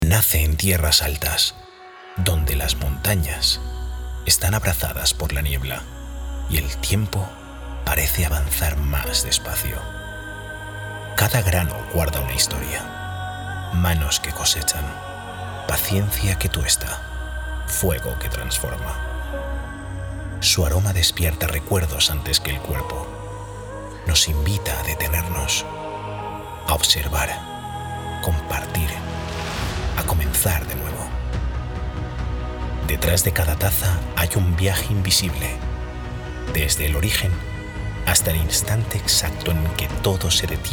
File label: jingle